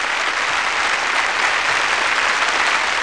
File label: Huge Crowd; Comedians - The People Behind the Laughter